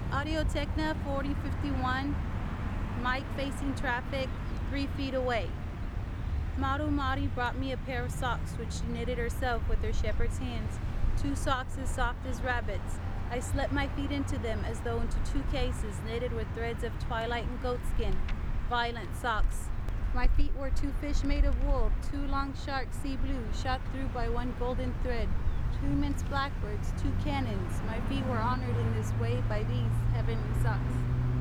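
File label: AT4051 3ft Mic Facing Traffic, Exterior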